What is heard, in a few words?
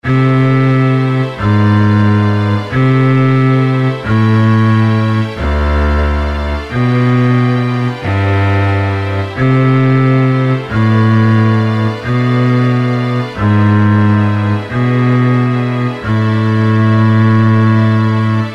Cello Classical Line 4'4